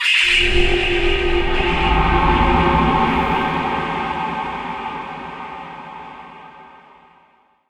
Creepy Stinger

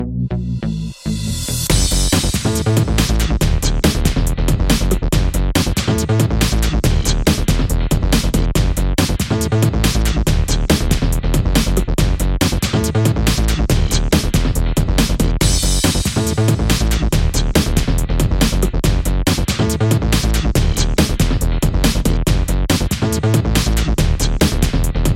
Music Game; Awesome Tanks 2

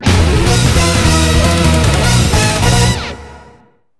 WINNER; the king of fighters maximum impact regulation a; ps2; KOFMIRA

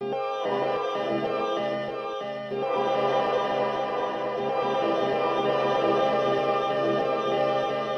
Clockwork Machines A Ambient

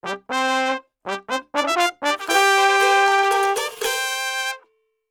Battle won

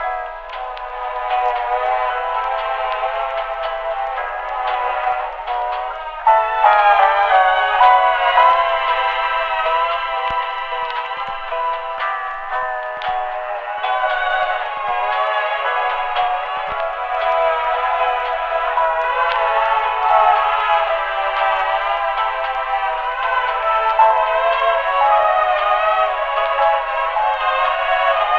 Musak Loop; jenny leclue detectiv

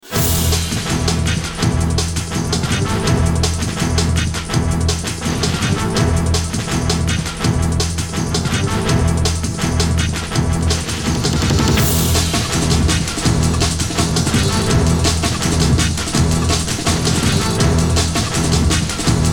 Military Base Medium; Serious Sam 2; Kronor

